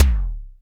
BD, Bassdrums, Roland TD-20

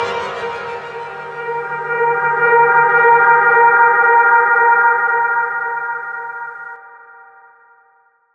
Distorted Atmos; Zero-G Sound Sense Grime Scene; 90 s Sample CDs

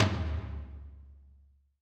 XLo Canon, Alesis SR16

